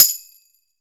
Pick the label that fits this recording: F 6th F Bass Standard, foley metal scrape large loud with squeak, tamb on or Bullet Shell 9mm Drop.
tamb on